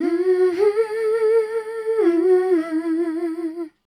MEL F#, Voice Spectral II, MELODIC; Best Service

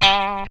GTR PIC; E-Lab; X Static Goldmine 2; akai

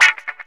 GTR WAH; FX GTR; E-Lab; X Static Goldmine 2; akai

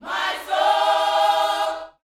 YOUTH CH PHRASE, Vocal Planet Gospel

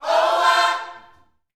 Vocal Gospel PHRASE

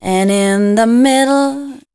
voice spectral, ly short

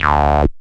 SYN TON; E-Lab; X Static Goldmine 2; akai

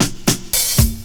DRMROLL; E-Lab; X Static Goldmine 2

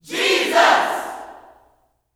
"JESUS", Gospel, YELL SHOUT; Spectrasonics; Vocal Planet Vol.1 Gospel; akai

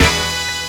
HORNHIT, HORN STAB

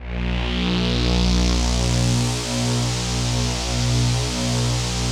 APAD, ATMO PADS; Best Service; XX Large

